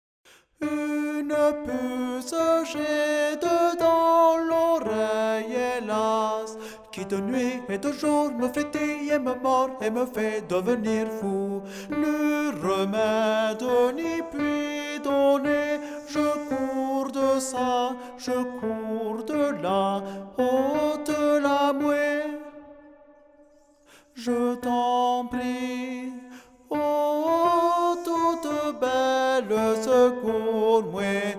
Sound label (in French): UNE PUCE TENOR